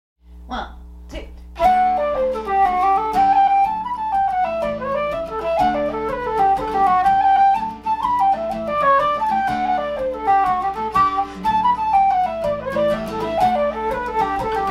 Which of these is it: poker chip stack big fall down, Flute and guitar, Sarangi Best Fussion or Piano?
Flute and guitar